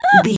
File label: voice fun character vocal crazy hurt